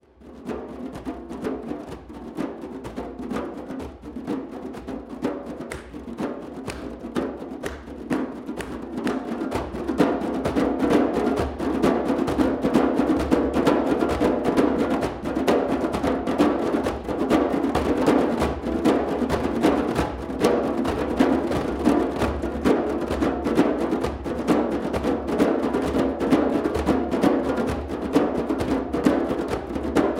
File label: djembe, percussion